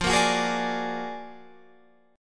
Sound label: Gb Augmented Major 9th, Guitar